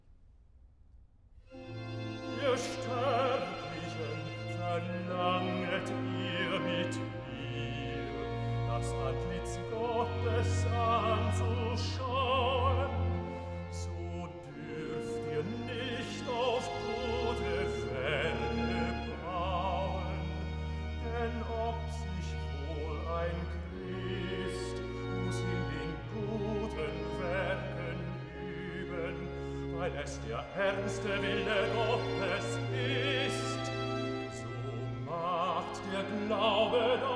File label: Recitative (bass); Johann Sebastian Bach; Wer da glaeubet und getauft wird BWV37; Archiv